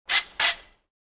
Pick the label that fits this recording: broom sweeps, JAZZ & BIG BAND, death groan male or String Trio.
broom sweeps